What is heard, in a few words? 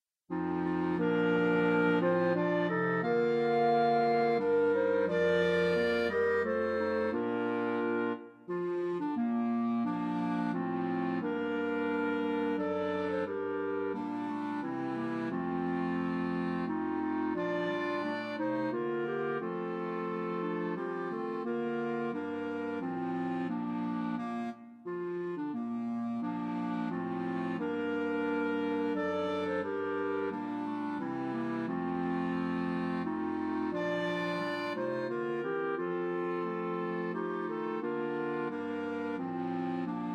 Clarinet Choir; STAR SPANGLED BANNER